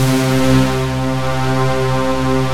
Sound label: ERUPTION, old synths; Rubber Chicken Software Sample Bank